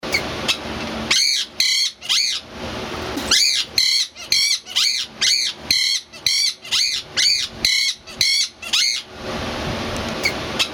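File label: Nanday Conure, parrot calls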